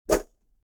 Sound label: swoosh